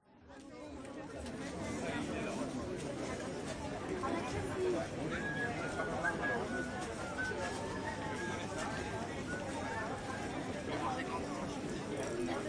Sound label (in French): marche binaural